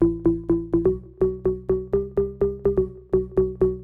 Organ chops (125BPM), retro house

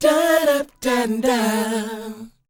DOWOP E 4; ILIO; Vocal Planet

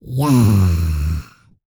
Vocal, R&B & Dance; ILIO; Vocal Planet VOL-4

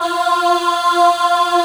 RICH VOX F3, RICH VOICE; AKAI S-Series CD-ROM Sound Library VOL-7

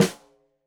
Snare Stereo, Drums; Deeply Sampled Drums; SMDrum

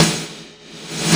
SNARE, DRUMS; Analogon

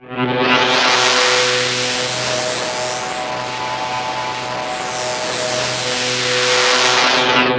FLANGE TONE, Science Fiction; E-MU Producer Series Vol. 3 – Hollywood Sound Effects; Mower Man

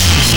CRACKED SCRA; Combo 1; E-MU Formula 4000 Series Vol. 2 – Techno Trance

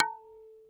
A3, Yamaha VP-1; Music Store; 90's Sample CDs; MIYABI

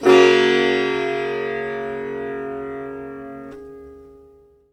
SITAR CHORD; SITAR CHORDS

